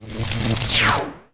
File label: Arc Zap